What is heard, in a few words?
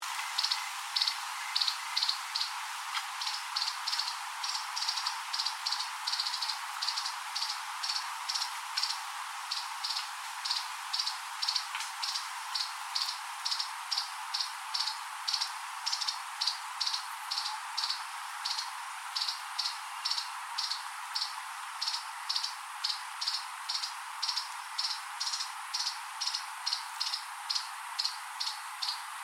L rubricata alarm; Host Song Clips